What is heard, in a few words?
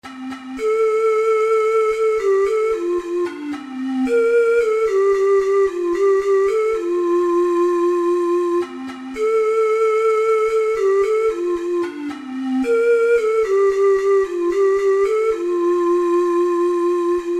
siyahamba, ocarina four hole